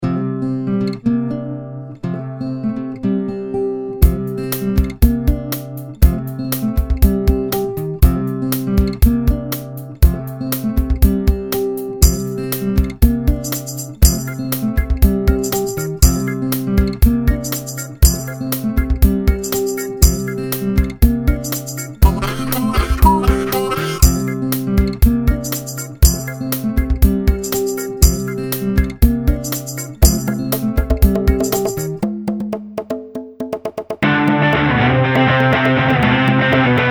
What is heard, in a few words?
Country Rock